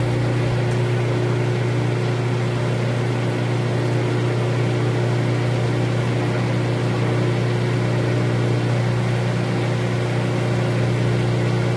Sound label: microwave loop; Machines; civ13; civ14